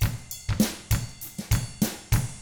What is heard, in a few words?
FUNKY, Drums